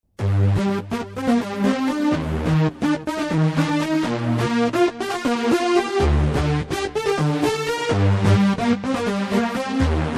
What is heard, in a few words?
LEAD FREEZE